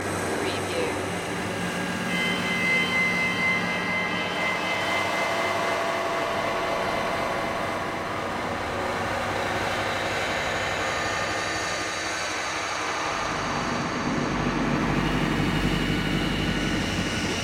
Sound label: shiny voltage background loop